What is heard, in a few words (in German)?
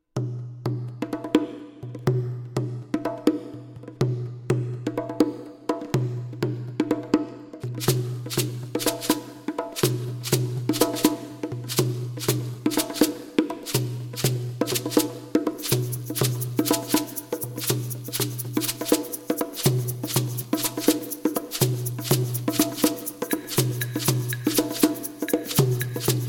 Bongo